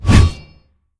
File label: swing; Smite; god Lancelot